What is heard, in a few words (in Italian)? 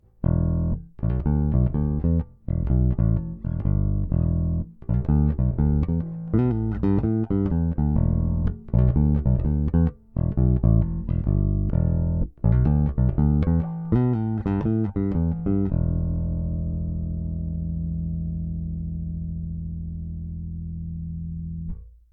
dita tono aperto